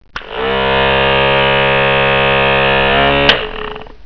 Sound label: RAZOR